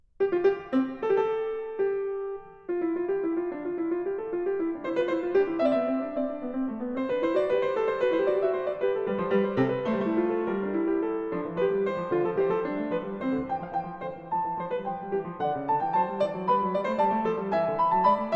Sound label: Fugue, C major; BWV870; Bach; Well-Tempered Clavier; Book 2